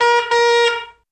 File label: Single Car Horn Honk; Various Single Car Horn Honks, Beeps, Chirps, Etc..